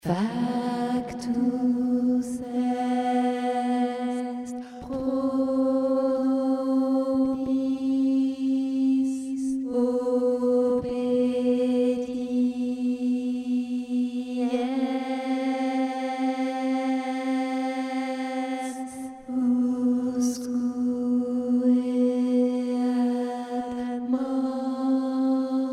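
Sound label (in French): passionne christus tenor contralto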